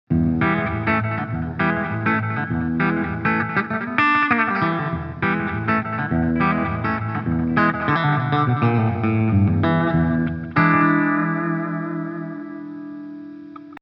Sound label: Slapback Delay